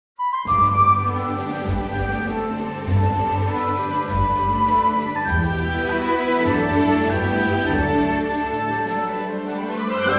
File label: 4 layer strings ww s horns snare